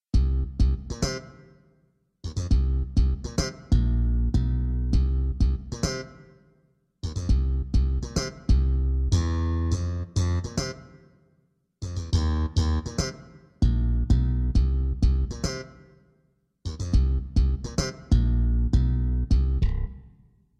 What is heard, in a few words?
Slap Bass; sibelius